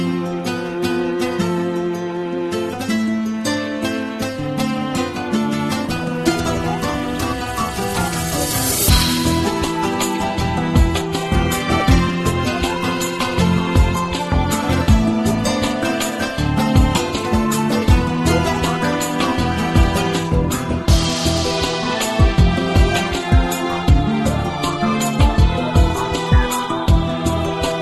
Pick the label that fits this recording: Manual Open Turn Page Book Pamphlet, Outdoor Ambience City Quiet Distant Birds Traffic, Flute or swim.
Flute